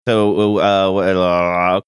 making mouth sounds

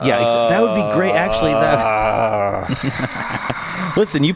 groan moan

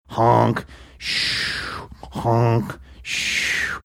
honk shoo honk shoo

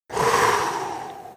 breathing sigh of relief

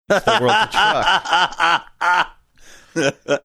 funny laugh

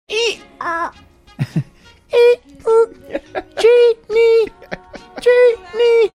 making sounds

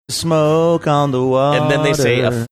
singing

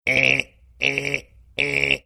imitating sound of alarm clock going off

